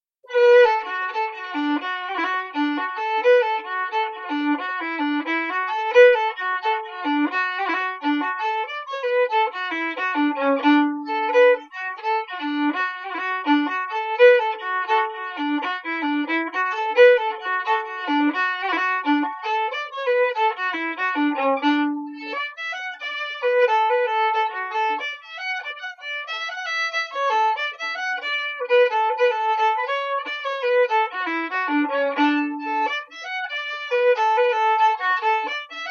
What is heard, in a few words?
Slow; East At Glendart